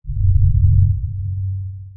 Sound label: sonar Ping Air Far Beacon Directional; Barotrauma; Real Sonar